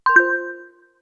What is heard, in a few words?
Sony Xperia 5 III notification